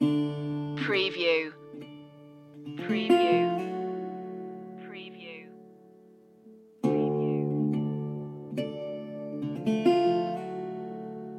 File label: sand and rust loop